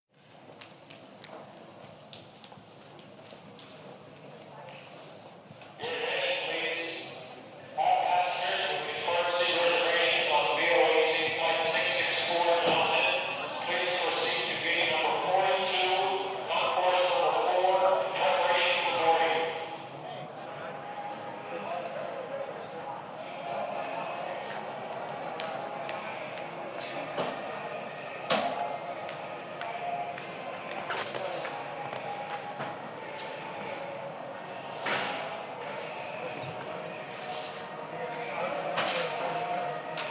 Airport lobby, large, flight announcements; B.O.A.C. 664

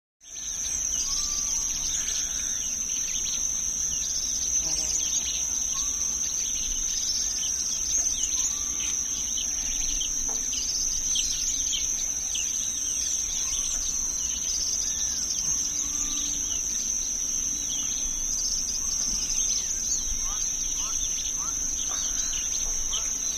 Country Atmos Countr

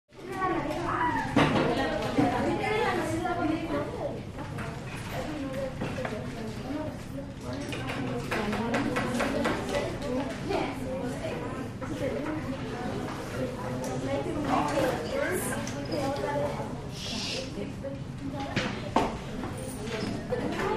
School Classroom Sp, spanish

